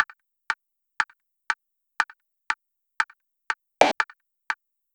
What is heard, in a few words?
clock tick tock sounds wi